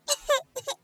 sad cat

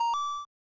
Mile Pickup long; SFX; Airport Runer

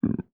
racoon

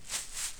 leaf drop

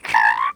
squirrel

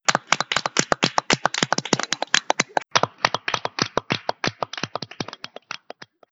clap small

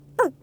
fox

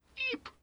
eep fly

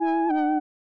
fishing caught trash

